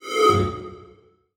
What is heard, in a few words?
Transition Whoosh Short; Production Element; Title Transition; AdobeCC